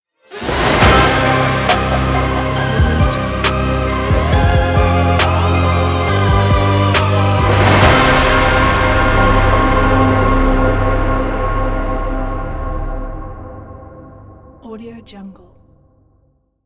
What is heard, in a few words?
halloween dark hiphop intro